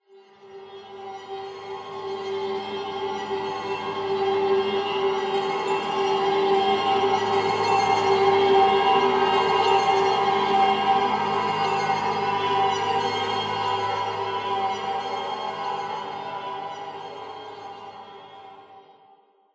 High strings Sample

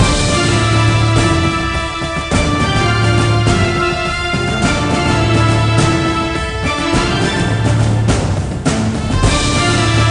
Track Music Action

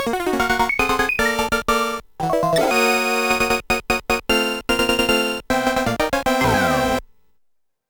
Extend ~ High Score Break ~ Round Clear; Namco Game Music Vol.2 2003

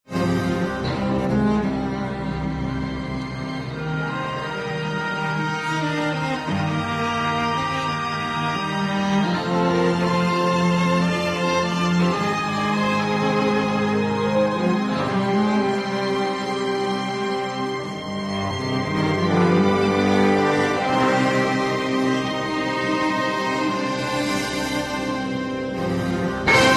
Loop Main Menu Extended Solos Variation; tales from the borderlands gamerip 2015

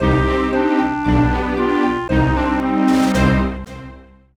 Competition Failure Jingle; My Horse & Me 2 Riding for Gold; nds

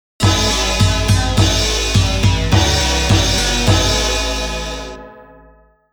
Bonus Start; arcade disc in jaleco variety

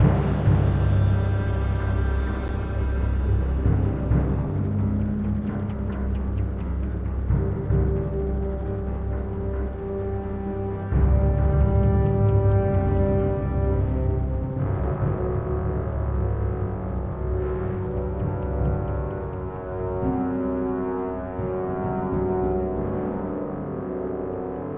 Ambient Theme

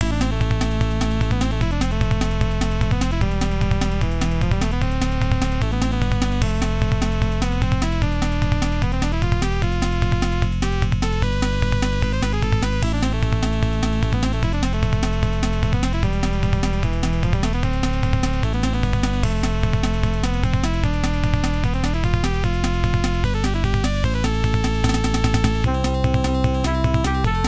Inorganic Beat; Laydock 2 Last Attack; MSX2

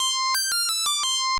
Boot; P.O.W. Prisoners of War; SNK 68000; arcade

